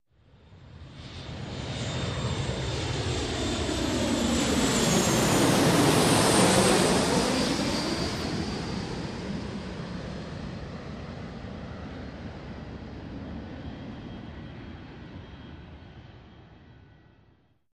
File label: Airplane Bys Jet Prop, av-8b-harrier